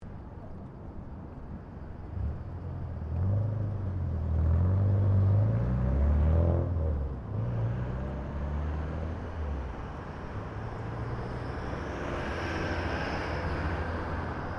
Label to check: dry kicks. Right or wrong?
Wrong